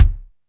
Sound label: DM5 Kick, Alesis DM5 drum machine